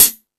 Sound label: High Hat, Drum Machines